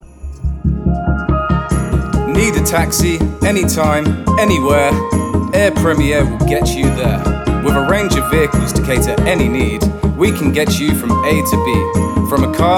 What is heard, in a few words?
jingle; air